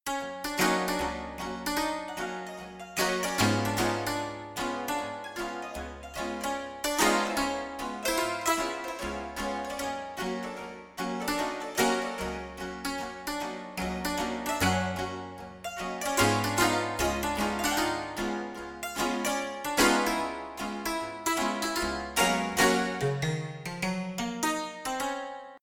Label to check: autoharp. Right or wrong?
Right